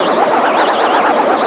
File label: blad loop; DARKOMEN